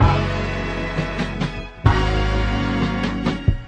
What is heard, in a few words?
horns transitional